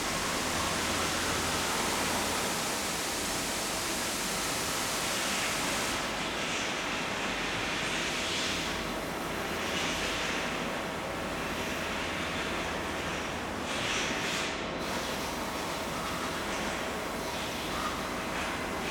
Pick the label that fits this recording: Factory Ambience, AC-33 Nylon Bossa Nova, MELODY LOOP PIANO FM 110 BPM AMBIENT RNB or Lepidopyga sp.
Factory Ambience